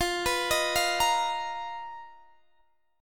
B Major 7th Flat 5th F, chord shape

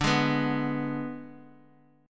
G sharp Diminished D

